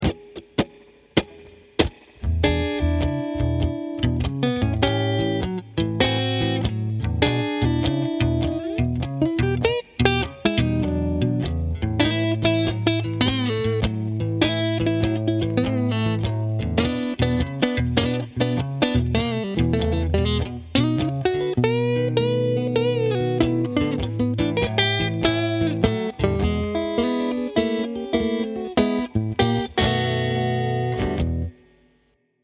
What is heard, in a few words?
blues, guitar